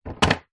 skateboard set down on concrete

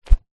rolled up news paper swing air swat no impact; foley

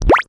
cartoon water drip; zapsplat

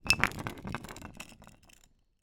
glass bottle roll concrete ground; foley

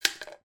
household plug drop in empty bathroom sink

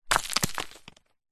foley stone drop ground light debris

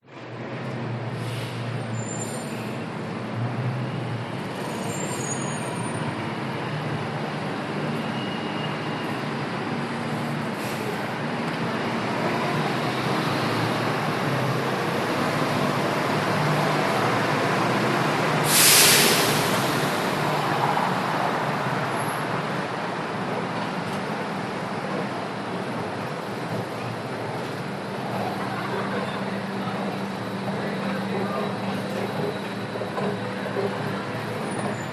Airport Terminal Vehicles People; LAX

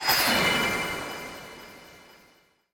Transition Metallic; bottlerocket

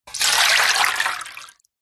household water pour fast into metal tin tray

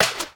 medieval crossbow bolt pass by; still north media

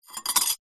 glass wine broken shard place into glass; household

